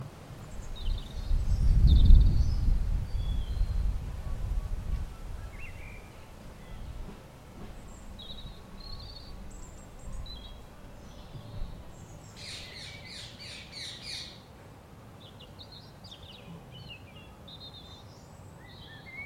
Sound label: may birdsong in my garden including shouty green woodpeckers